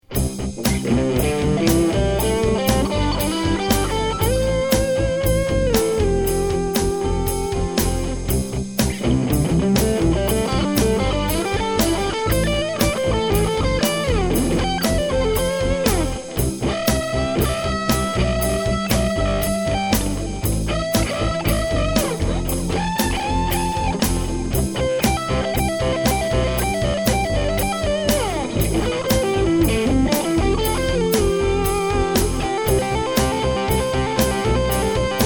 c maj pent scale solo

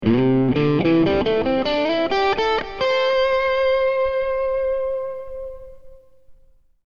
c maj pent scale, guitar

